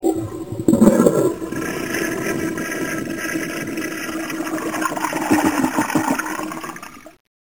Toilet

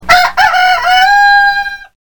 rooster

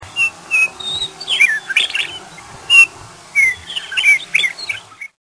meadowlark

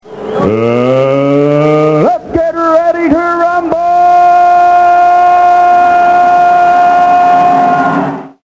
rumble

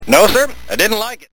didnt like

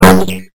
RAY BOING

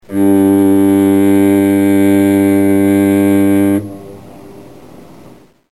foghorn

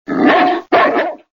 wolf attack

